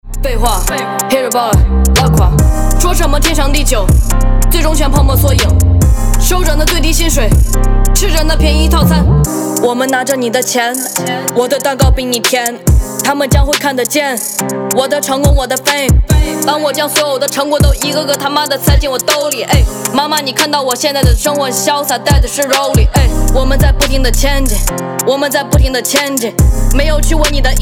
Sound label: HIP HOP II